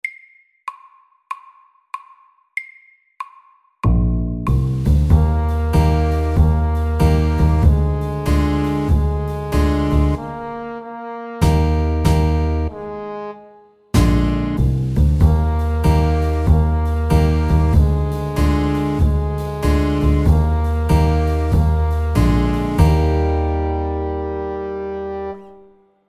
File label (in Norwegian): Godteri SLOW 95